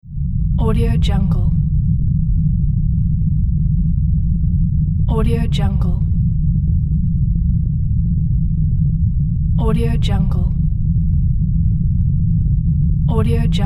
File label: earthquake rumble